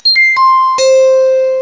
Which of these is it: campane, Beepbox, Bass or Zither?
Zither